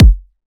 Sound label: Riddim Kick